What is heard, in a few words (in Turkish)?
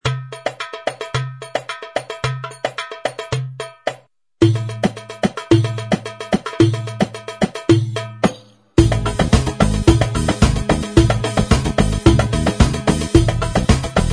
style tellitelli, gem genesys pro oriental